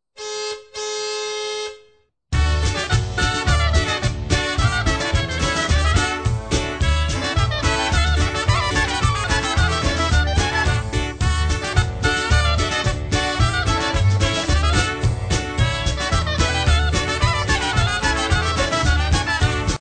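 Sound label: (INSTRUMENTAL)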